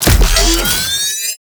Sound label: Beam Start; Warframe; Corpus; Corpus Railjack; Crp Admiral Agent